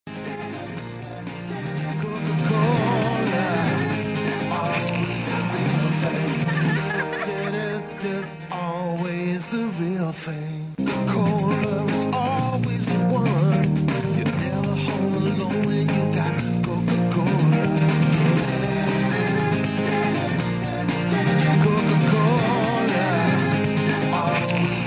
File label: Happy Guitar